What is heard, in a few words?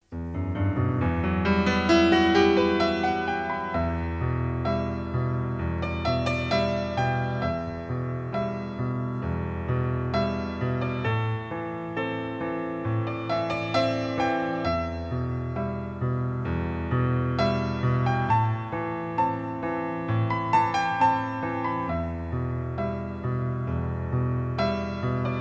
Piano Solo